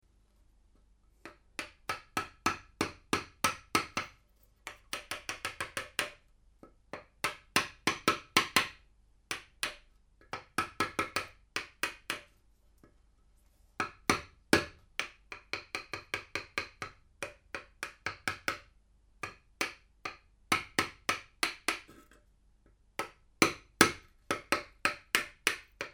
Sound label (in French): gouge plan proche